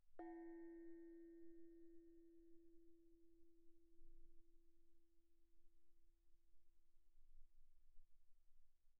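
thai gong E4 pianissimo, Percussion gongs & tamtams; thaigongs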